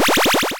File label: a Whistle